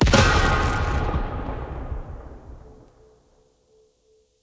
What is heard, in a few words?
land; smite; god raijin